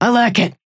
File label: wolf ping affermative; Werewolf